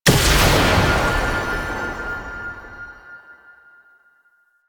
Gunbrella Alt Shot, Weapons; Warframe; Tenno; Koumei

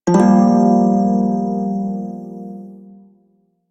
Shawzin Min Chord; Warframe; Prime Shawzin

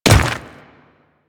Dirt Step, Footsteps; Warframe; Grineer Royal Guard

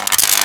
Ocean Electric Surge Small; Ambience; Warframe; Grineer Ocean

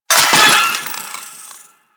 Loot Container Break; Warframe; Sentient Devourer

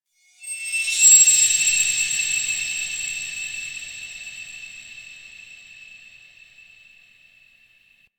High Shing; Warframe; Ambience; Lore

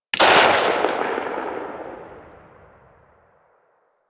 Lever Action Rifle Tail, Weapons; Warframe; Tenno

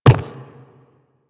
Inf Quadruped Medium Walk Default, Footsteps; Warframe; Infested

